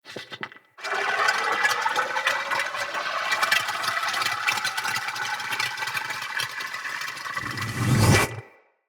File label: Shrimp Lantern Burst Warning; Creatures; Baliq